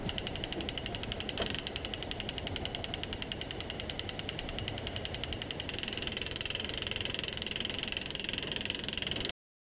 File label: loose nuts and bolts